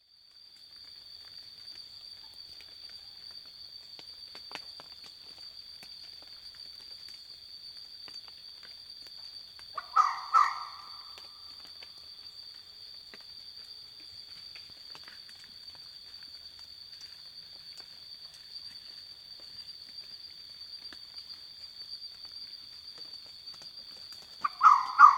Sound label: Red Fox; dharug atypical